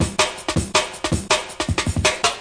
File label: off break, drum loops and breaks, 162 bpm; 161 to 180 bpm